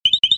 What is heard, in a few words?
blink; BenQ B502; notifications